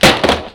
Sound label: Dropping A Large Gun